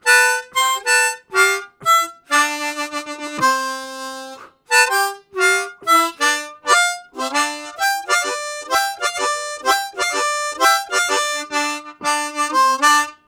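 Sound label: Octave Long, Harmonica Riffs; Zero G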